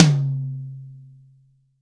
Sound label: 00s High Tom Sound C Key, tom drum single hit tuned to the C note